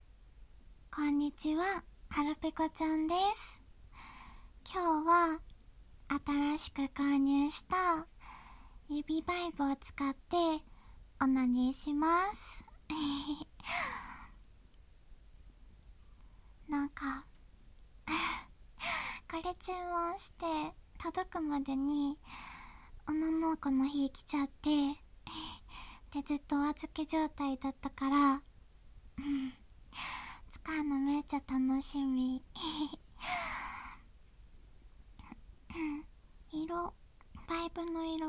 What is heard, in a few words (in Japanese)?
指バイブで潮吹きオナニー